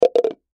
Plastic Drop Cup, Plastic Drop Cup On Open Side Down; Foley; Drops; Objects; Blastwave